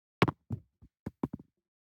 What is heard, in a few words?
DICE on Cardboard, Throw and Roll, Foam, One Die; Samples; ArticulatedSounds; DICE Sfx Party (Extended)